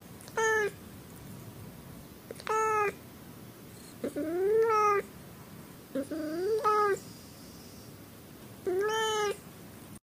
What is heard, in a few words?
Sad Meowing Cat